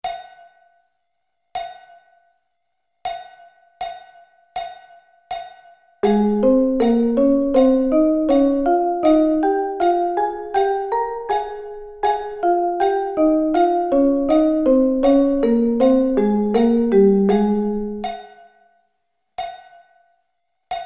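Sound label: A flat Thirds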